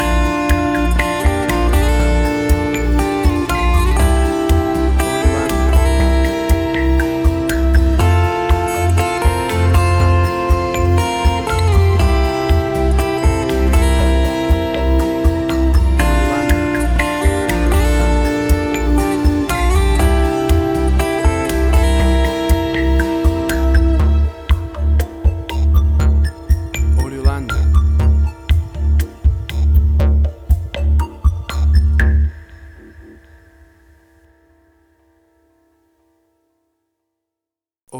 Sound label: Acoustic Guitar Mood Music